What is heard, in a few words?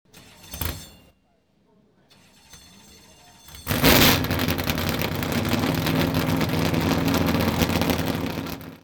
nascar pontiac start